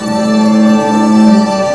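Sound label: ORCHESTRA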